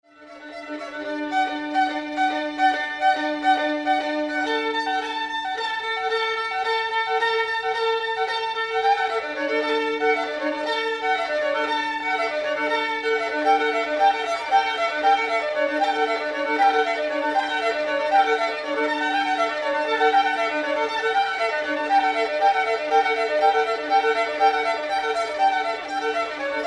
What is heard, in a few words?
american dreaming violin